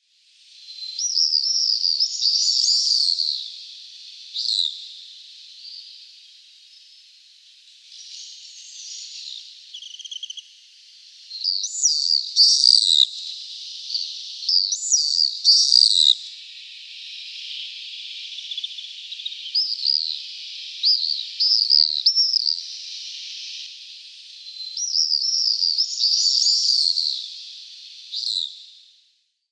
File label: Fork-tailed Swift